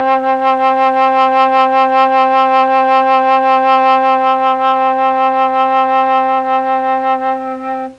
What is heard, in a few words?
C2 flute, mellotron